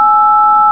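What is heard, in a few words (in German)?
Tonwahl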